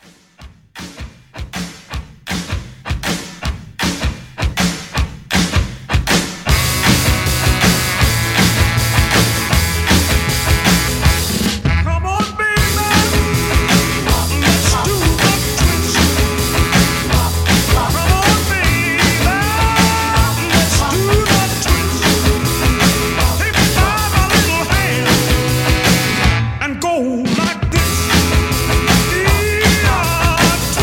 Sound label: 60s 158